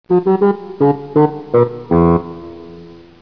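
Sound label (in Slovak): Fagot, bassoon; Revelation Sight and Sound SK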